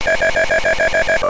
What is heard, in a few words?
laugh bot